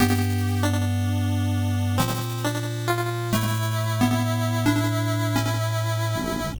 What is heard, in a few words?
Mono Stereo, Game Over